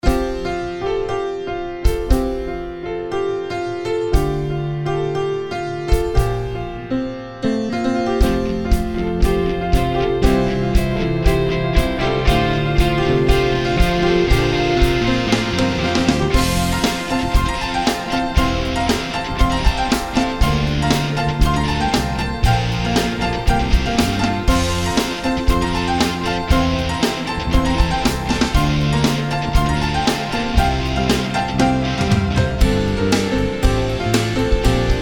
Pop Track piano

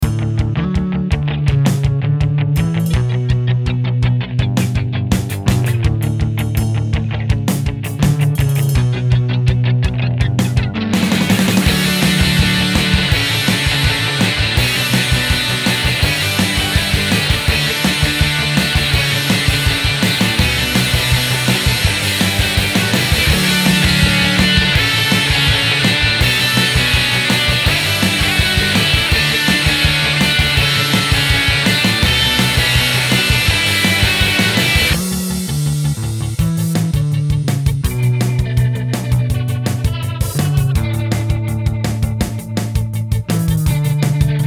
rock guitar

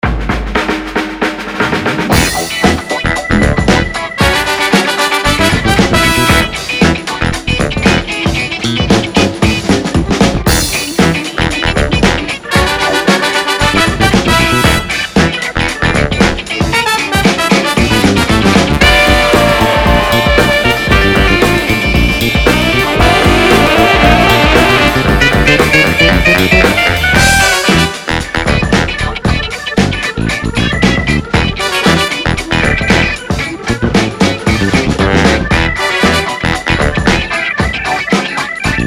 Big Town Funk

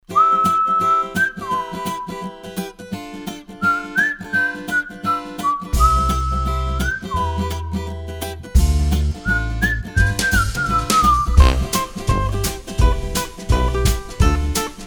Whistlin and Pickin

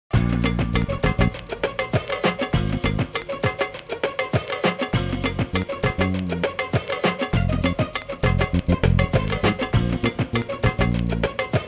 pop track

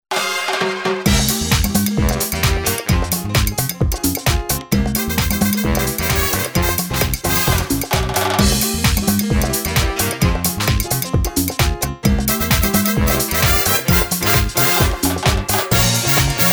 salsa

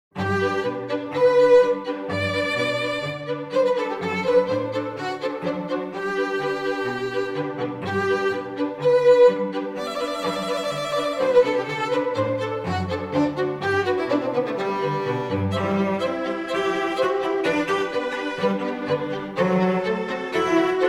String Quartet

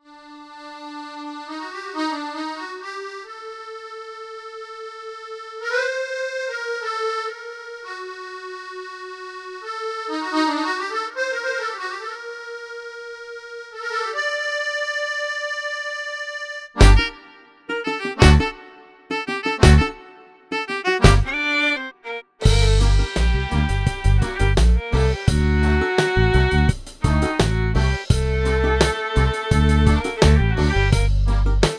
mideast pop accordion intro

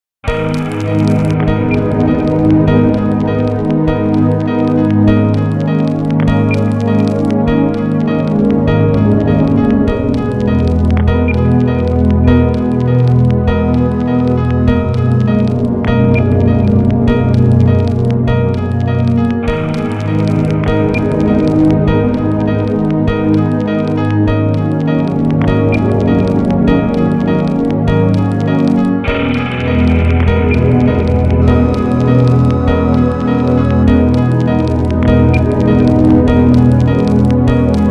ambient piano with fx

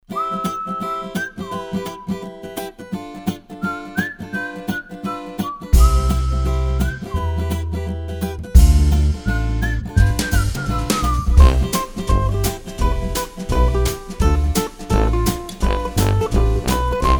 Whistlin and Pickin

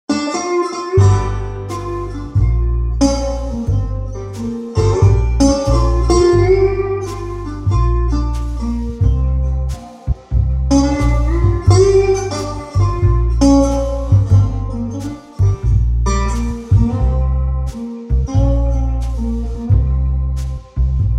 Blues Slide

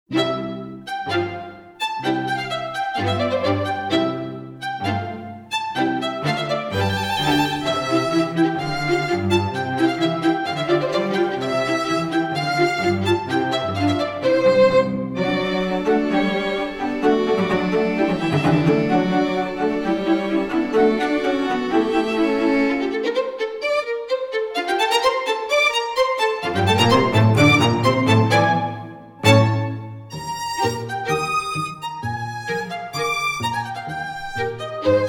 String Quartet

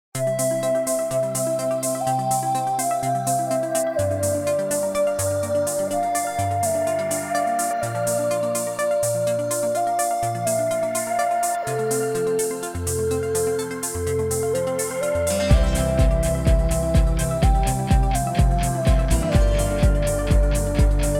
Pop Track

